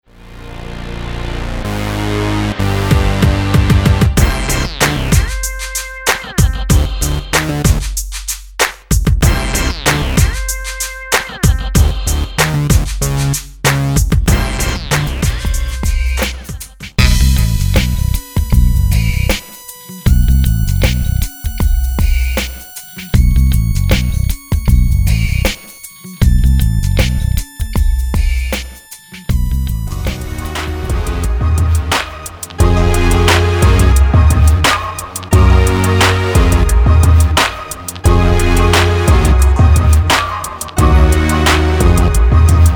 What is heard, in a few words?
Hip Hop RB mix